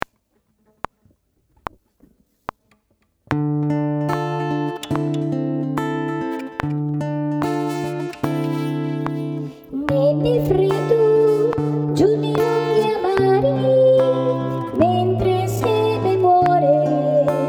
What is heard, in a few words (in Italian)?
benedici, soprani